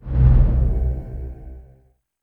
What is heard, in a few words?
ui large dialog close